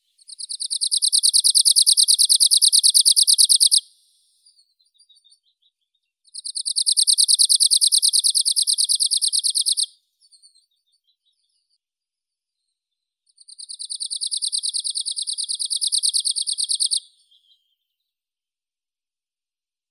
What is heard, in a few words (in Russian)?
penochka talovka phylloscopus borealis; zvuki prirodi